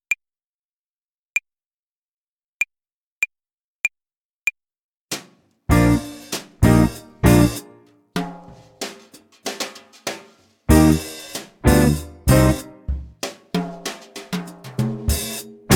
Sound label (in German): Tempo und Rhythmus Übung 6, Play-along, Gitarre; Jazz Club